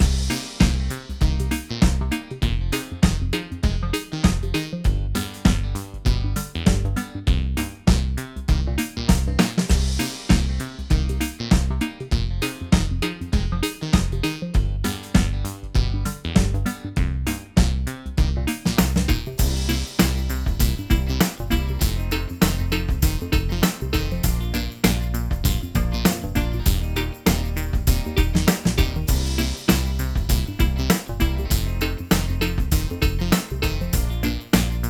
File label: Bubble Gum Groovin' (Looping), Here's a funky little track; by Eric Matyas